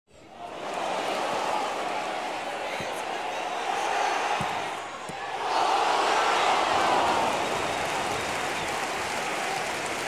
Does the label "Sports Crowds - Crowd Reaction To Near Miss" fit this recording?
Yes